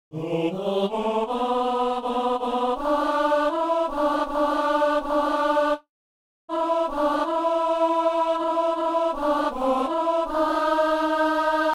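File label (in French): psaume, ténor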